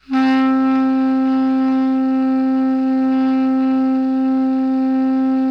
BARI PP C3, Sax; Giga Samples Collection; BARITONE PP

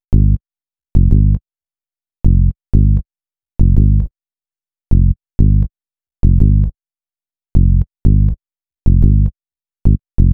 Bass, Hip-Hop

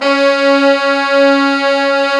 BRS, Fat Pop Sect; Roland L-CD702; Fat Section

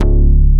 fat bass; Dance; BASS SOUNDS; Masterbits; Dance Trax

